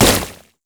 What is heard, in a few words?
void ooze; LoL; kogmaw